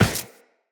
Run Default, Footsteps; Tenno

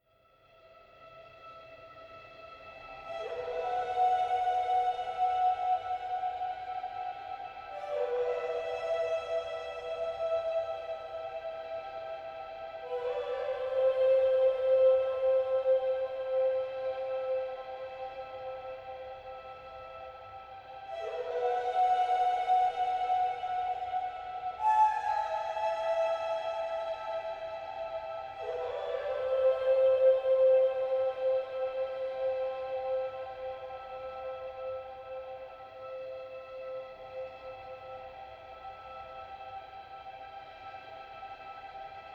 Amb Siren Strings; Dynamic Music; Neathuns

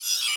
Hud Gear Slide In

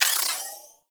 Ability Cooldown; Warframe

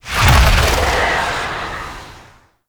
Fly By Quick, Vehicles; Flight; Warframe; Liset; Stalker Liset Skin

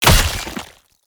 Great Sword Impact Flesh; Weapon Impacts; Player; Swords; Combat; Founders Great Sword

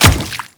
Grineer Royal Guard Water Step, Footsteps

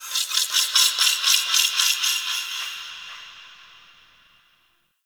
Sentient Texture Small E; Warframe; Sentient Lair

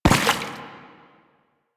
Step Water, Footsteps; Lasrian Butcher; Lasrian Soldiers; Foley

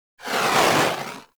Heavy Grenade Launcher Projectile By; Warframe; Archwing

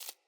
Rat Step, Foley

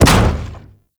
Arachnoid Camper Step Default; Warframe; Corpus

